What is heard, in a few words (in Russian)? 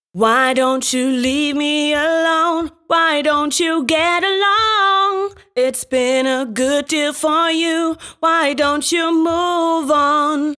Me Solo, женский вокал